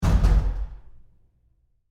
Door, Bathroom Stall, Close, Roomy, Lite; Big Room Sound

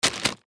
Plastic Object Drop; Drops; Blastwave; Foley

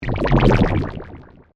Plasma Pulse By, Scifi, Pass; Science, Fiction; BlastWave

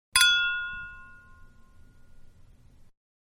Wine Glasses Clinking; Clinks; Objects; Manhattan Production Music

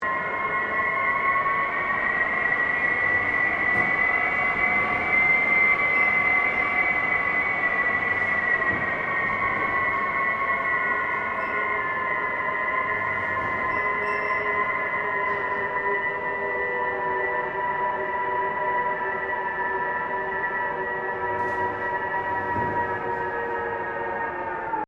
Wind Shrieking Through Liftshaft; Weather

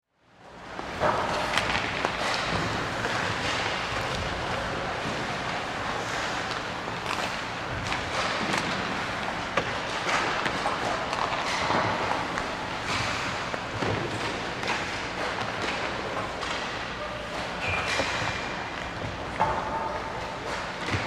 Sports Hockey Practice Heavy Activity

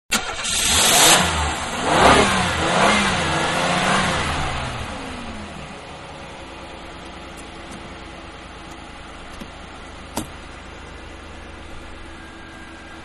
BIG CAR Start UP Accelerate, Exterior; Cars (Sound Effects:Vehicles); Manhattan Production Music

